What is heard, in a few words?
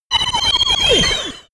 sci-fi laser shot burst